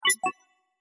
Confirm; HauntedBloodlines

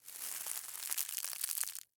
Forest Leaves Slow; Footsteps; HauntedBloodlines

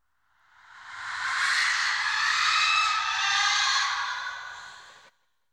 Ghastly Screams; MinorHallutinationsSounds; SFX Producer

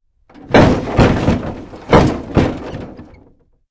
Vehicle Door Bang; MinorHallutinationsSounds1

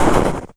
STEPS Snow, Run

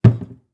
Cupboard Door Close; SFX Producer

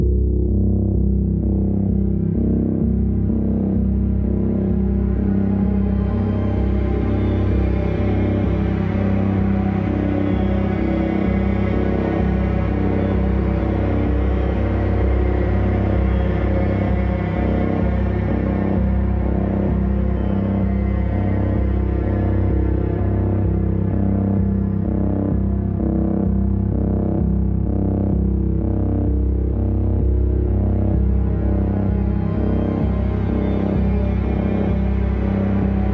Heavy, Horror Atmospheres Music

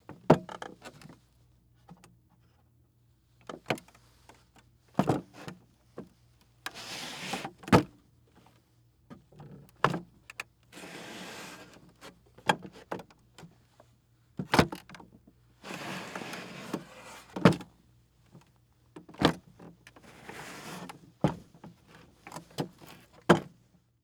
Footsteps Wood; HauntedBloodlines; SFX Producer

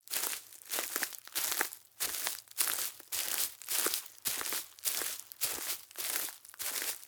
Footsteps Hay; Haunted Bloodlines; SFX Producer

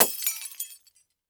Glass Light Bulb, Break; Lights

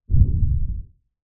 Monster Footsteps; HauntedBloodlines; SFX Producer

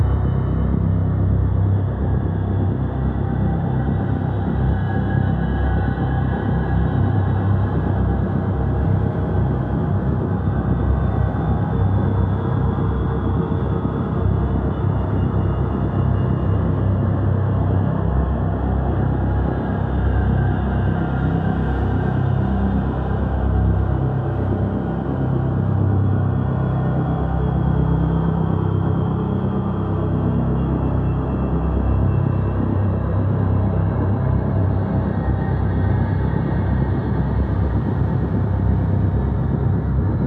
Strobe Loop, Horror Atmospheres Music

